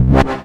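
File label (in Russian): кнопка цифровой восходящий спокойный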